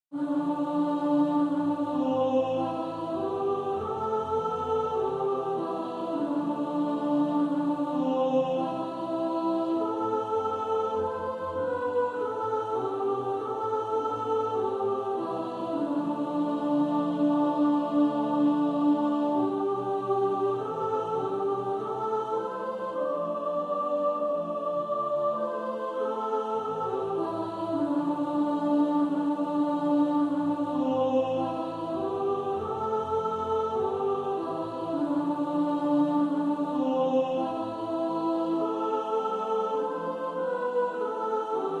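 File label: What wondrous love is this soprano, choir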